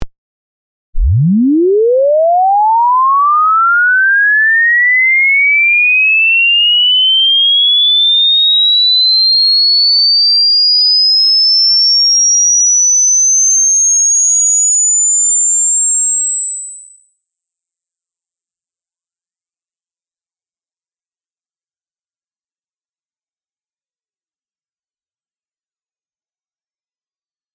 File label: Sinus osc from 0 to 20000 hz fast